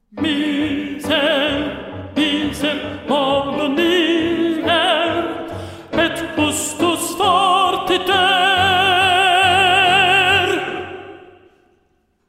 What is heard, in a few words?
olim slot, tenor